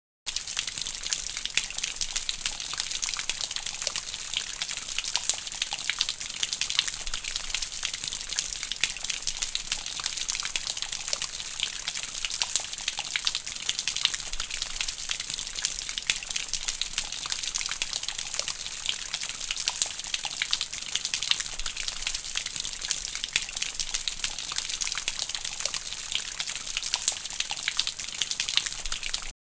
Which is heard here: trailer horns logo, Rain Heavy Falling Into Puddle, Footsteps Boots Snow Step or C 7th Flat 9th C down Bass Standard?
Rain Heavy Falling Into Puddle